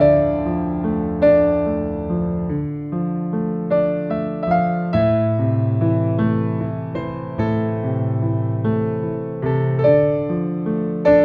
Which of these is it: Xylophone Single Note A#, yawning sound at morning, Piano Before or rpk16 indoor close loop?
Piano Before